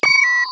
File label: Bleeping Buttons; Digital Rain Lab